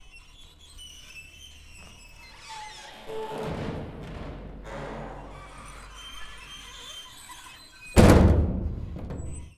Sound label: Creaking Swing Door